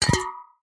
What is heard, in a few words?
soda cans tins empty bash together